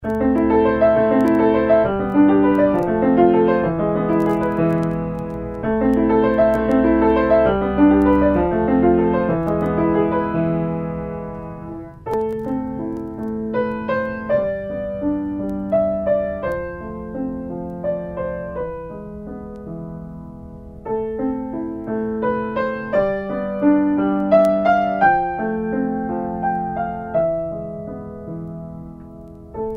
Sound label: spanish guitars